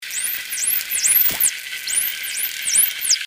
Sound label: SW, dolphins signatures; Shandy